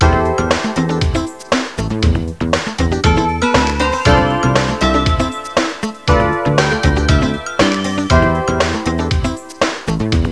suonerie businessy

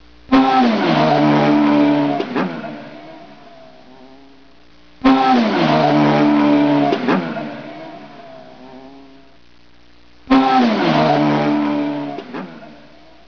Moto; trasporti

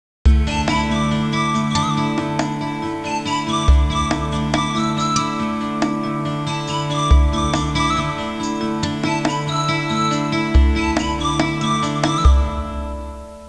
suonerie musica hawaiian

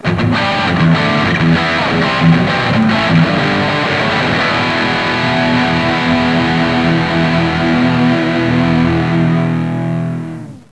suonerie musica rock

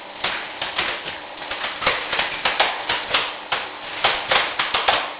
natura nature

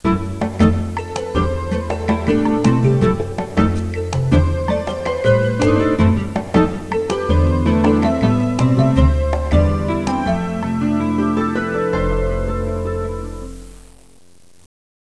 suonerie musica jazz

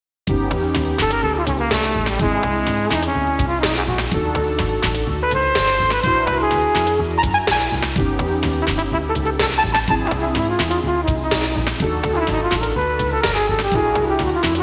musica urban

